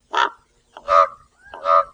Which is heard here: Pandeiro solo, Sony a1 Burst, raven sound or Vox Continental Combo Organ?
raven sound